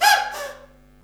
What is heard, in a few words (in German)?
quitsch duck, Cartoon film effects